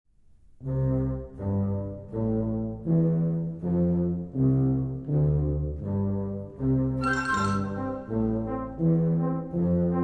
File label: cellphone suite